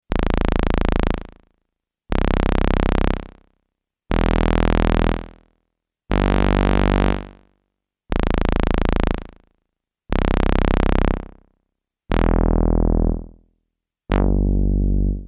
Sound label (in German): Cybersound T1 Deep trocken